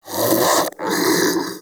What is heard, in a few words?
Armadillo Vocal Breathe